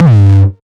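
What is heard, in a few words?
909 distorted resonant - G2, kicks; Mbase 11 Kicks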